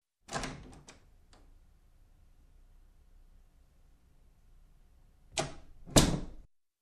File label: Office Door open close softly